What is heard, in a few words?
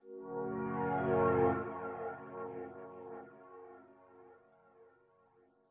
SYNTH PAD ETHNO 160 C, Sphere